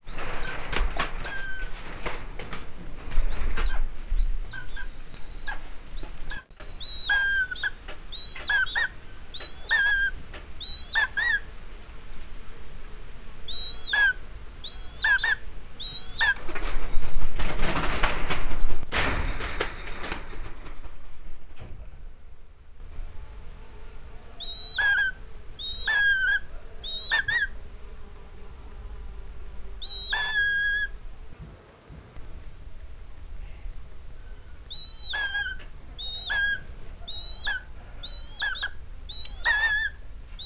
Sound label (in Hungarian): hét hónapos, egerészölyv; miskolci zoo 2009